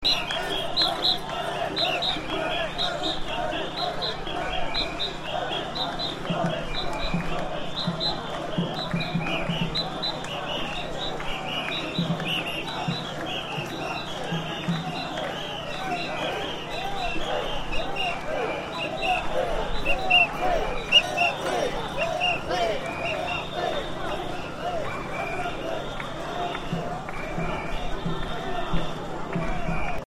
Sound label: fest mikoshi